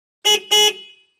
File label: Montero Horn Beeps, 1998 mitsubishi montero ext.